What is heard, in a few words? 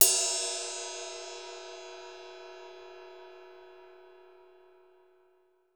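ride cymbal; USB Soundscan vol.03 - Pure Electro; AKAI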